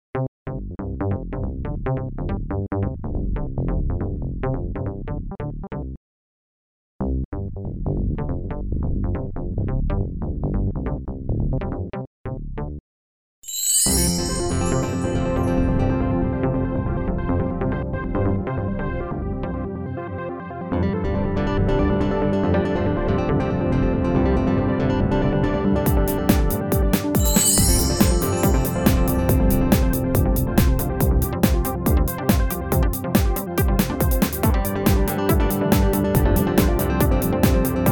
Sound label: Jingle Bells